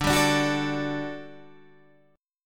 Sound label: G Major 7th Suspended 2nd D